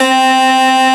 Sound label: piano; Analogon